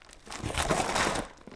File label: Rocky Step, outdoor, Footsteps